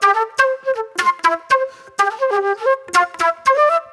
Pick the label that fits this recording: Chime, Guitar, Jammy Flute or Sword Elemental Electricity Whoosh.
Jammy Flute